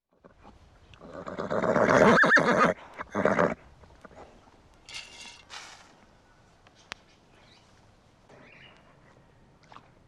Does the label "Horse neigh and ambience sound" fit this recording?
Yes